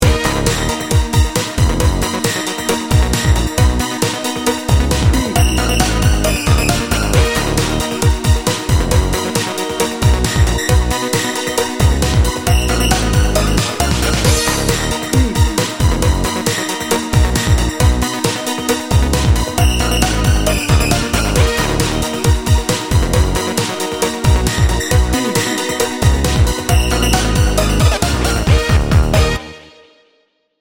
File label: energy bounce